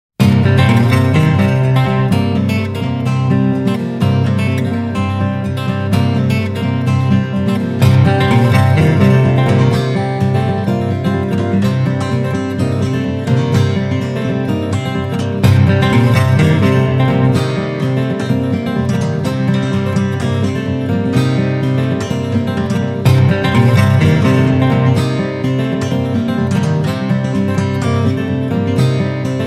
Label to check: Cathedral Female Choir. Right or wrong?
Wrong